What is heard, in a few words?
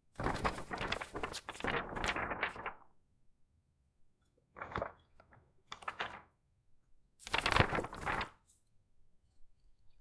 adding a hard paper in, object manipulation